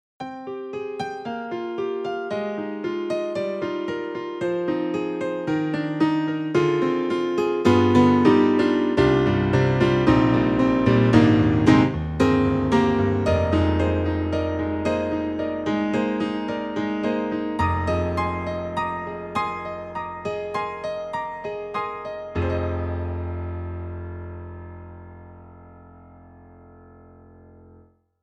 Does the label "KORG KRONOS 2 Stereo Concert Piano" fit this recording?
Yes